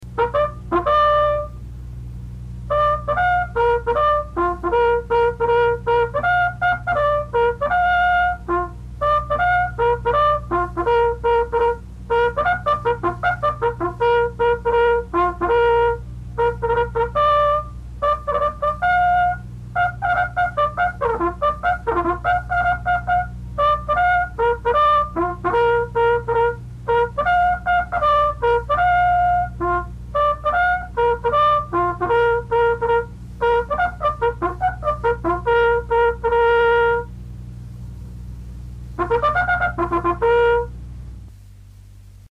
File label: Morning Colors, Bugle calls